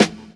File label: SD SOFT, snares; E-Lab; X Static Goldmine 2; akai